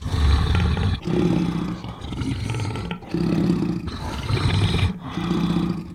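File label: deathclaw conscious loop; npc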